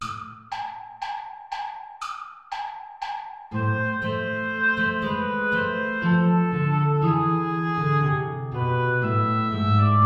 play along without guitar; hilton john; you lovers that have loves astray

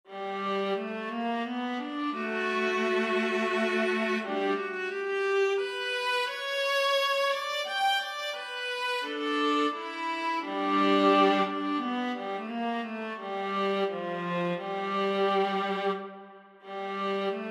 intermezzi larghetto

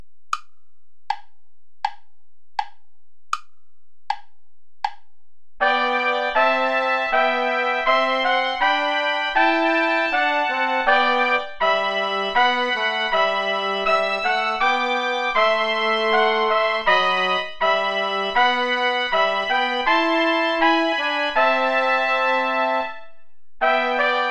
play along without trombone; reger max

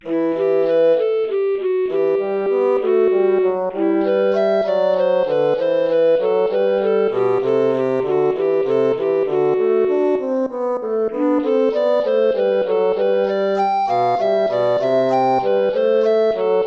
gigue

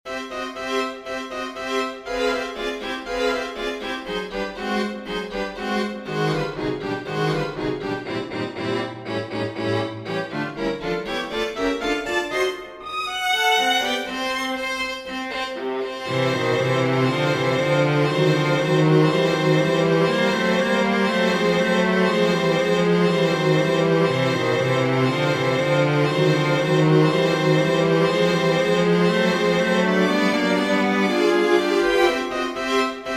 for string quartet; tchaikovsky piotr ilitch; the nurse's tale

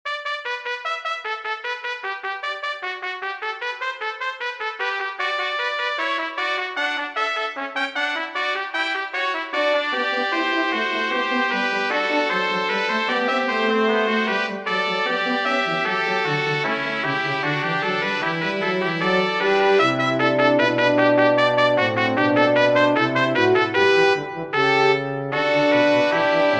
canzonetta major for brass quartet; buxtehude dieterich

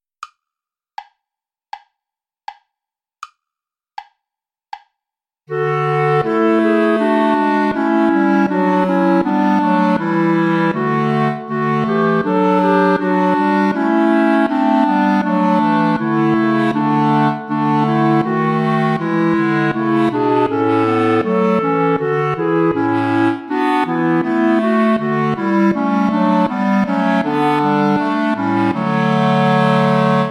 play along without clarinet

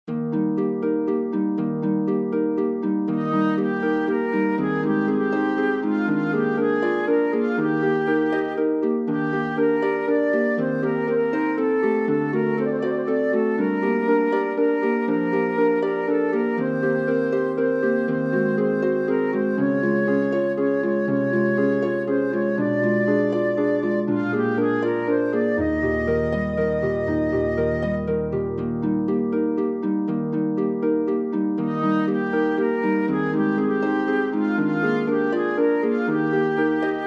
for flute harp

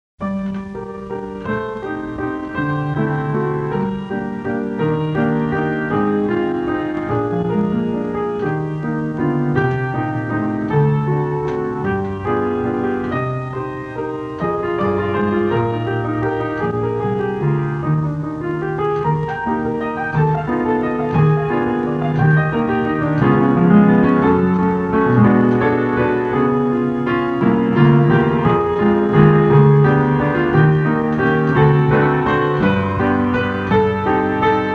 waltz

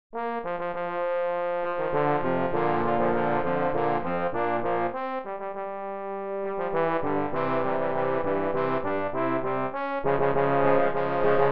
fanfare for trombone octet

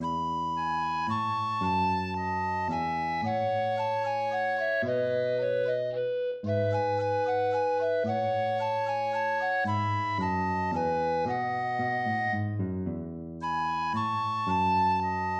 minuet major

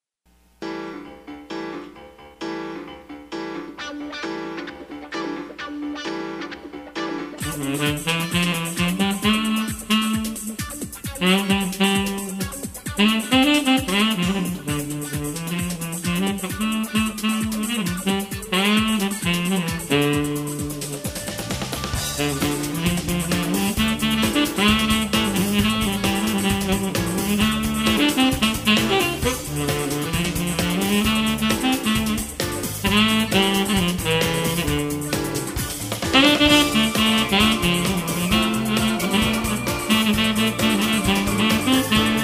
piano sax; traditional

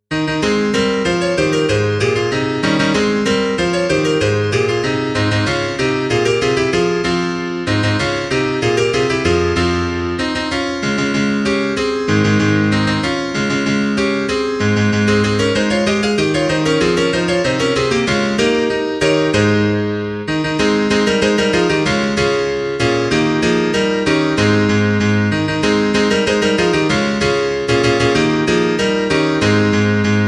happy birthday piano

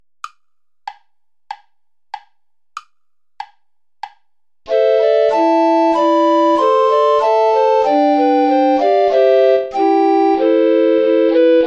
play along without guitar

